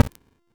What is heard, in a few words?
Short Sword Perfect Wind Up; Weapons; Player; Swords